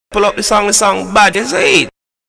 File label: pull up di song